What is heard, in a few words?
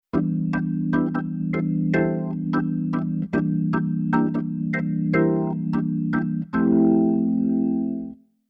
Keyboard Organ Bossa Organ